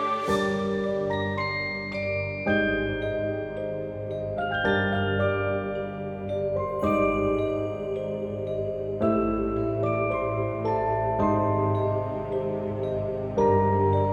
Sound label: map loop; crystalvale